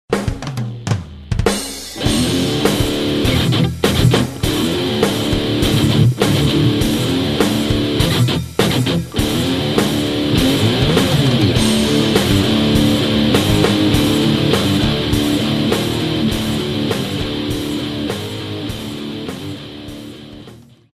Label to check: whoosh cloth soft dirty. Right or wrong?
Wrong